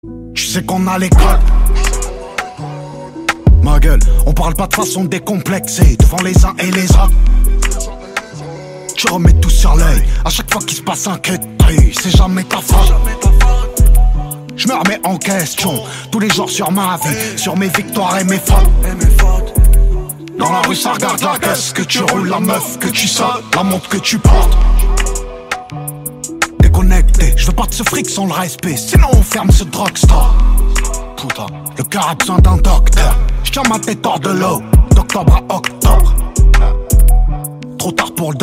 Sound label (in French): Batterie vide